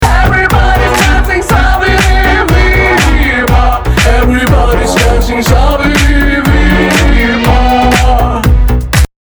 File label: Ringtone